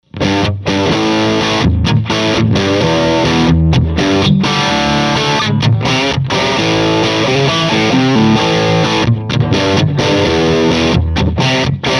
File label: bright crunch